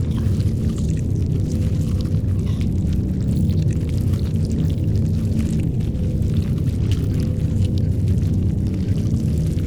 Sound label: ant ship titan idle; Stellaris; biogenesis; ships